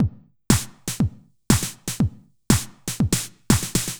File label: House Drum NoHH Reverb